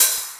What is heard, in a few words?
KOMMERZDRUMS